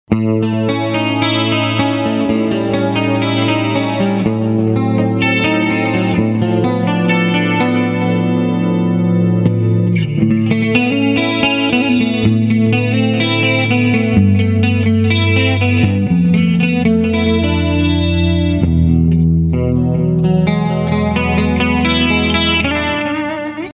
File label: guitar test clean